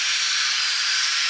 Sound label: Steam Vent